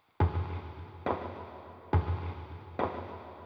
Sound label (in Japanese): tokei; ドンタン; 時計のような足音のようなバックサウンド ループ用